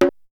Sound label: Bongo; ZERO G - Datafile DISC 1